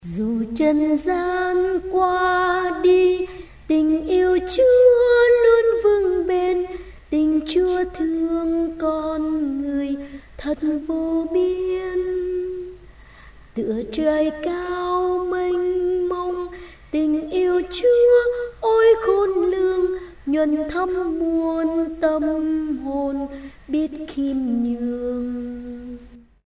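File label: soprano tenor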